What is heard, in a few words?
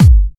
Bassdrum; Best Service; House Definition VOL-1